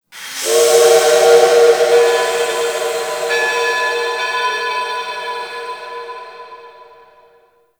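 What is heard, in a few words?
Shipyards Distant Train Horn, Ambience; Warframe; Grineer Shipyards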